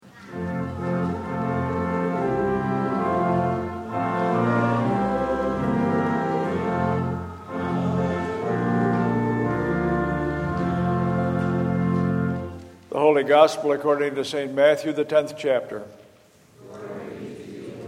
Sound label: Alleluia - Holy Gospel